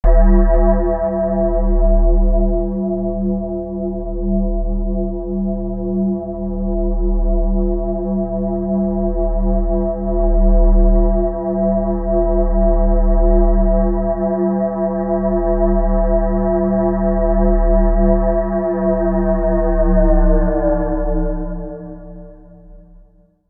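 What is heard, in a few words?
DSS PPG Delay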